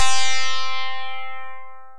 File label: Thin Harpsichord, Synth Keys; Wavetable; Ableton; Synth Essentials